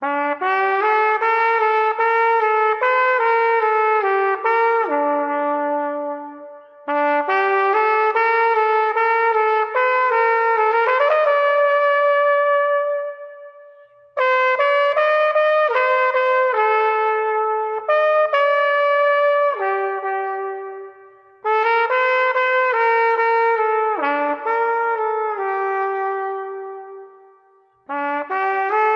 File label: Flugelhorn